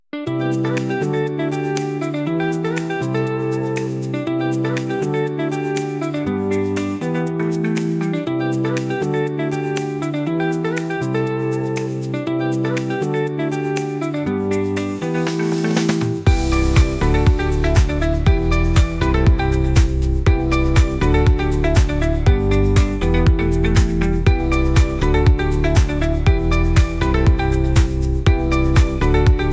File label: background music; Draw Your Sword - Bible Trivia Game